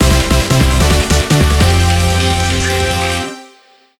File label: bgm levelup; Armed Emeth